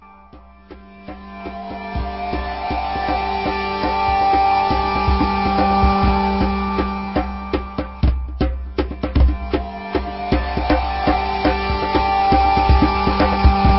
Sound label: Aztec Background